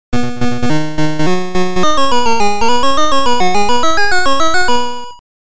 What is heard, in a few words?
Stage Clear; Congo Bongo